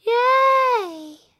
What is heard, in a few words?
Yay; Bobby Dukes